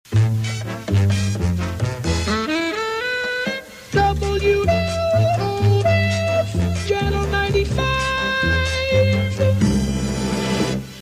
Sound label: falsetto; 950 WLOF; ch95